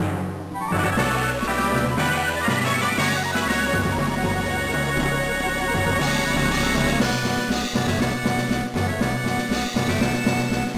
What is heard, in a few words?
Stage Clear; Shining Soul II; GBA